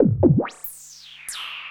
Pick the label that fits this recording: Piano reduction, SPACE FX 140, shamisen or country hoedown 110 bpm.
SPACE FX 140